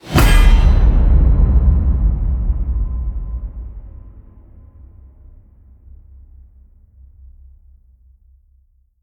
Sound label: HIT Massive, Cinematic Hits Designed